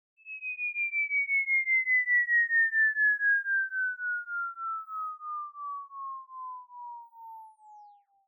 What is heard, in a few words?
comedy cartoon falling tone; sound effects one